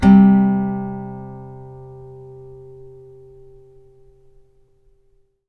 5ths C3, Blues Guitar; ILIO; Fretworks

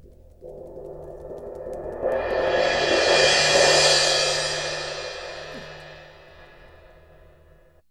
CYM ROLL, AMB SOUNDS

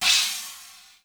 CHINA TYPE 2, HipHop Misc Perc; E-MU Producer Series Vol. 8 – Platinum Phatt

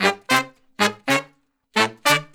Horn Section

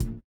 VIBRA; Spectrasonics; Metamorphosis

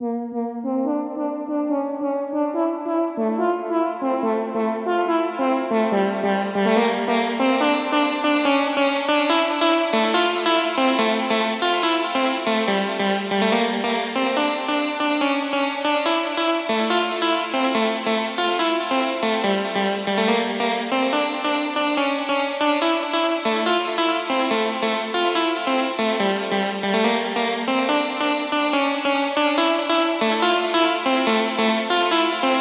PWM Kit 142BPM Verse - PWM Sound WET, Gmin; Constr Kits; Melody & Synth; Vengeance; Essential Clubsounds Vol.5

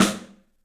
STEEL S, drums; 3D Sound

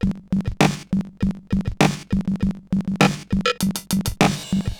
Bent RX17 (100BPM), cyborg beats; musicradar

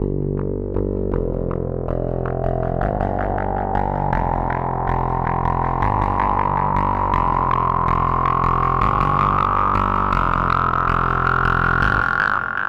Sweep Paranoia, Bass; Zero-G Smoke

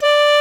D3 PICCOLO; Northstar; New Gold VOL-2

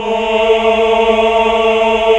HAL AAH. AAAH Uni

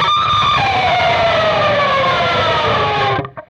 PRS SCRAP, Guitars; PRS SCRAPES

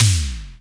Percussion, House

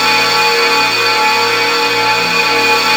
A#3 METAL; Northstar; The Wizard VOL-1

